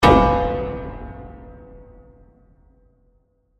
Hit Metal

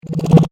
Teleport, science fiction; Teleport Me To The Future - Quickly

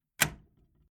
Household Old Door Bolt Lock Locked Closed Fast; Creaky Door Sound Effects

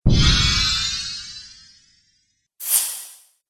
Magic Spells; Magic Sound Effects

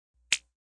Cheep; Chirps

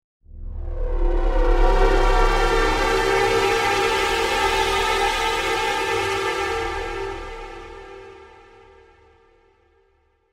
Terror Wave, Scary futuristic wave transition.; Stingers; Sound Design

